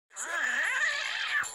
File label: Cat Sound